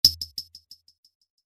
stuffed and dropped; notification sounds

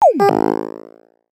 springy incident; funny

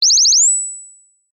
wake up tones; oringz pack nine

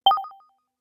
when; notification sounds

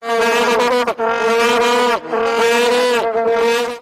vuvuzelas in a hurry

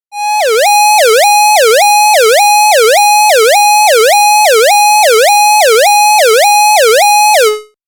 siren

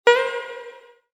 me

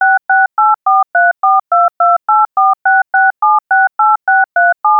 dialing sound dtmf

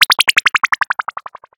fly, message tones

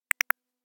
involved; notification sounds

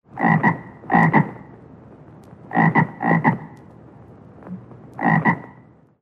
frog, another bullfrog; animals

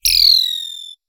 frozen powerlines; notification sounds

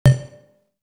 show stopper, message tones

